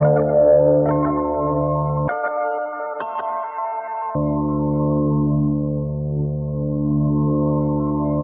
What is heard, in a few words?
buzz and twinkles, Loops Electronic